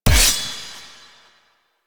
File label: Blades Charge End; Warframe; Warframes; Garuda